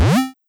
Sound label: magnet pull